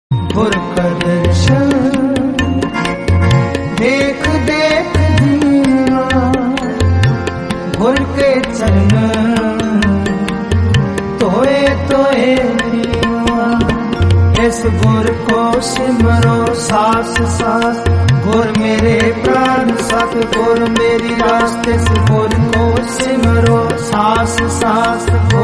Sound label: devotional punjabi song